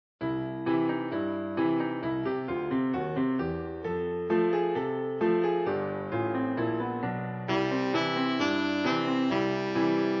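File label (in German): Altsaxophon; Jingle Bells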